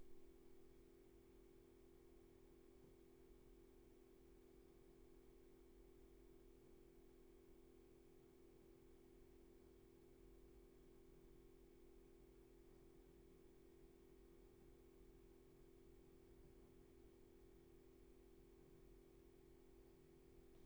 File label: room tone